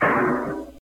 tympani; Neverhood

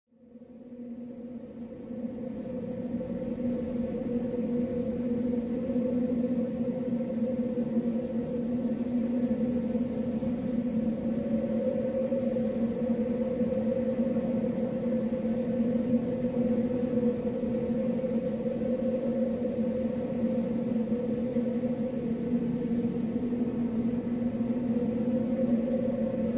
desert, ambient; PHARAOH

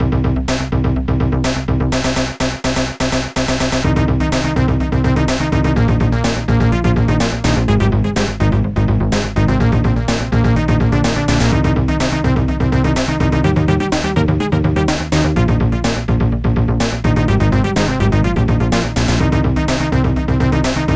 bassomania

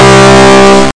square boing, cartoon sfx

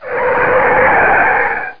brake, rally; rchal; PC Gamer 42

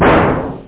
tympani

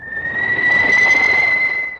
explosive missile; Earth and Beyond; EA Games

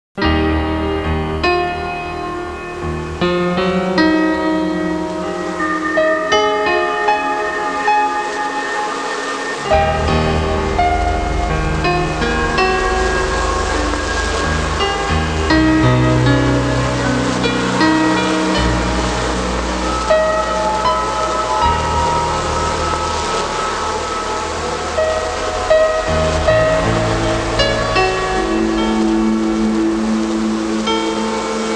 insect; Innerspace

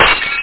GLASS; FinalOdyssey

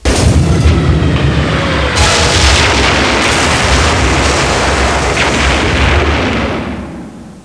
Explode

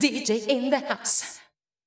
VOICE GRL HOUSE 125 A, House Voice

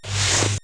Door Up; Treasure Galaxy (1994); Learning Company

